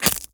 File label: bite, zombies